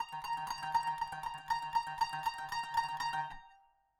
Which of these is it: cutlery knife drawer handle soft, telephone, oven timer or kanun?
kanun